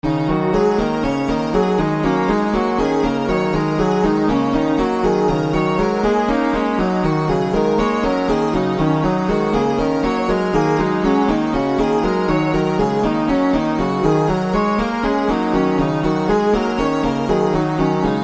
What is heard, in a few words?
Piano Test